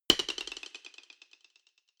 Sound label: drumstick hit move hard